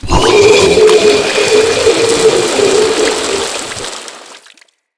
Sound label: Vomit Attack, Infested, Crawler, Nauseous; Characters